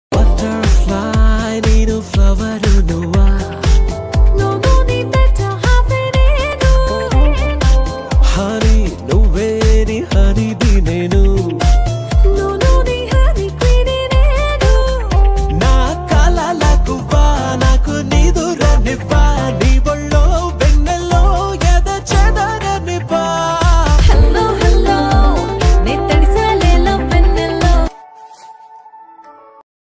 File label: love bgm telugu trending bgm ringtones; nani; lavanya tripathi